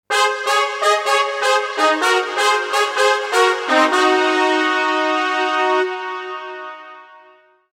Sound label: fanfare; VBL Jingles